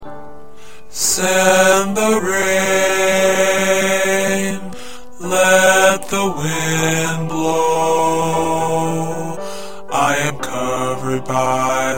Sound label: Bass, choir parts